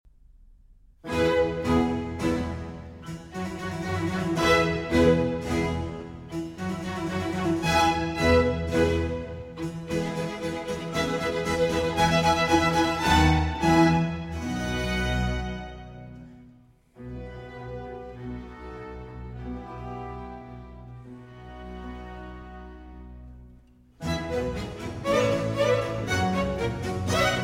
Cello Concerto in F Major, Allegro; Vivaldi; RV412

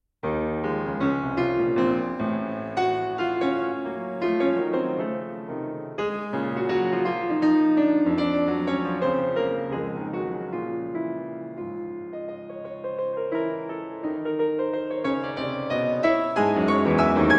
Piano Suite No. 8 in B-Flat Major IV. Finale

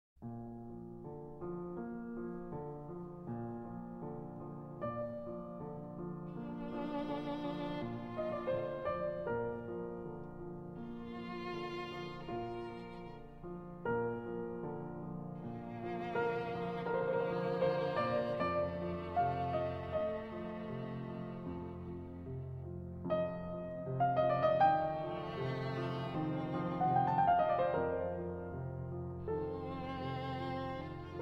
Violin Sonata in F Major, Adagio Molto Espressivo; Violin Sonata No. 5; Op. 24; Spring

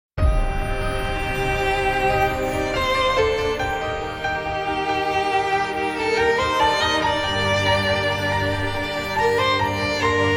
Orchestral Version

